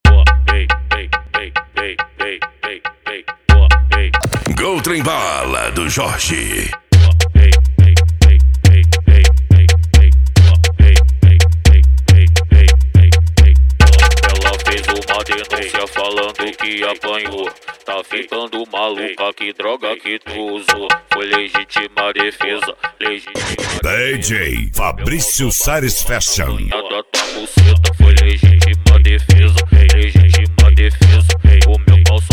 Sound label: funk mega bass